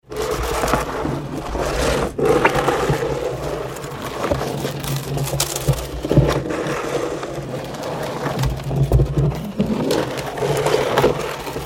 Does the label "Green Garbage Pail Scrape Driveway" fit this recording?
Yes